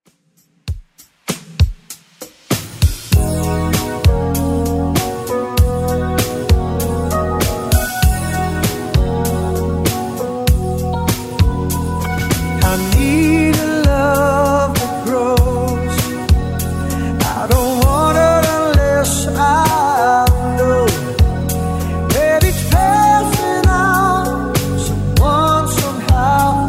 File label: 80s 98bpm; Redrum